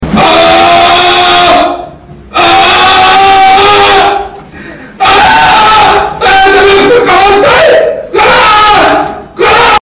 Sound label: auuuhhhhh